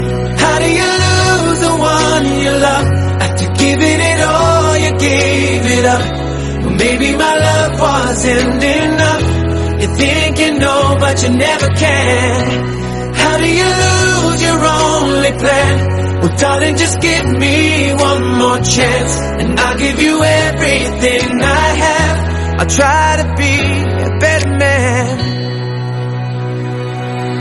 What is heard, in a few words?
sad english song tone ringtone